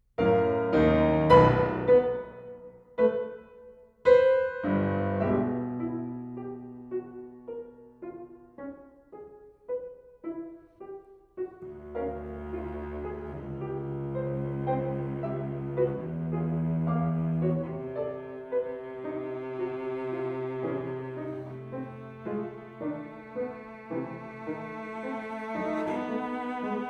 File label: sonata for violoncello and piano